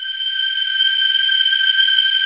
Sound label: HEAVEN VOX G5